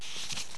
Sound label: PAGE TRN; AMBER